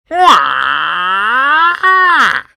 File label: Roar Longer; Hollow Knight; Tiso Roar Longer